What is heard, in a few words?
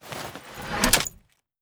blade 2 hand sheathe